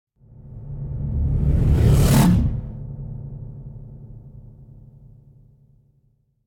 Whoosh Heavy Air Rip Tiger Growl, Drum End - Reversed; Hollywood Edge; sound designer's toolkit v2